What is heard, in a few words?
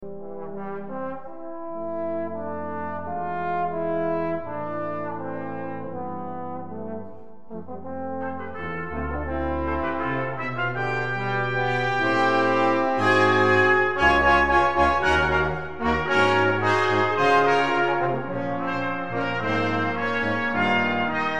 Brass